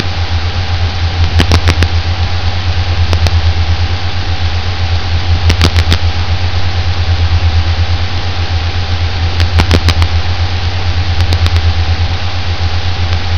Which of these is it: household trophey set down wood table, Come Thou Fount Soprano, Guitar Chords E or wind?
wind